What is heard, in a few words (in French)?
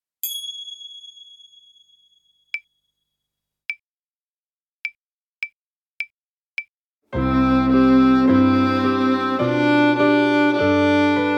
Sur le pont d'Avignon Alto